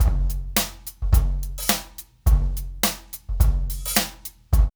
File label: DRUMS, Loops; East West; Funky Ass Loops